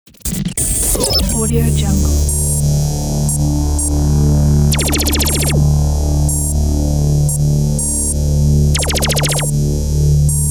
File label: radio jingle ident